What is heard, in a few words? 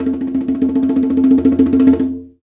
PRC CNGAROLL, AFRICAN PERC; Roland LA